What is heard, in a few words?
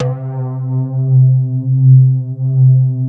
MARIMLOG, JV PADS; Pro-Rec - Synthsonic